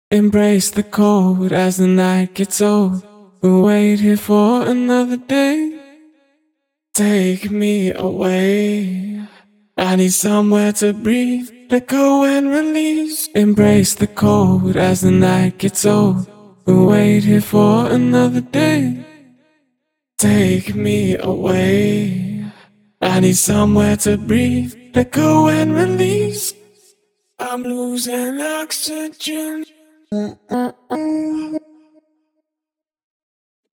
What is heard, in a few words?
Vocals